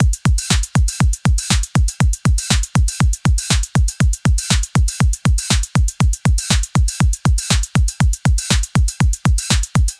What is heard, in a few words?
beat sound effect, rhythm